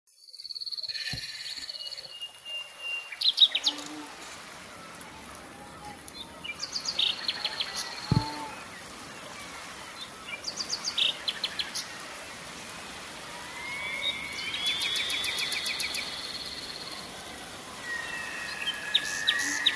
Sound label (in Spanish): Música Alegre y Positiva con Canto de Aves